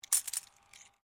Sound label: Coin Penny Drop Penny, Old Australian pennies; Money